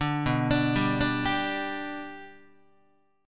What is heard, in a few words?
B Minor Sharp 5th, Guitar; arpeggiated; JGuitar